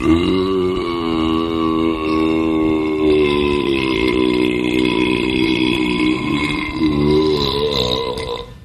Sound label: burp long